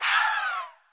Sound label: mutant die; OpenGameArt